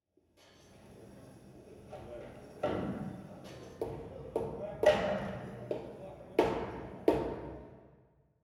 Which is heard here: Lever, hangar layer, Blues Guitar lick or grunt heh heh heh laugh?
hangar layer